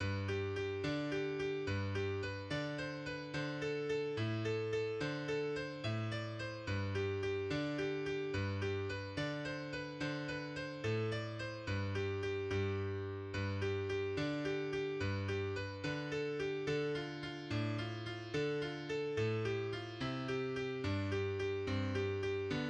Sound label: irish washerwoman